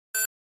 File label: Flash Beep HF Elect Bu, flash beep